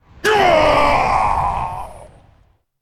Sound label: Male Night Elf Death